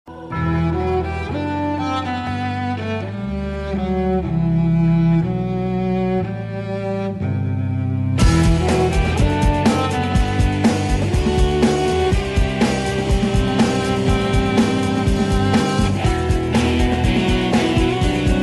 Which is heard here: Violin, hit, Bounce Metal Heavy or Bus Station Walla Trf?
Violin